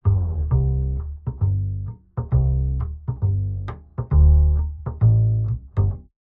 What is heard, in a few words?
Bass, Jazz for piano